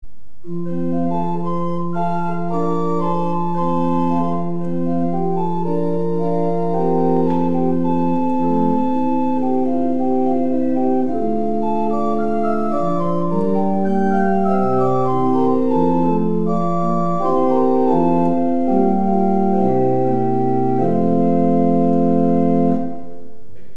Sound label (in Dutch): orgel, Holpijp 8', Roerfluit 4'